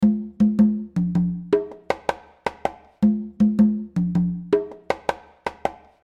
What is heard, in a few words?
Perc Bright Med Chamber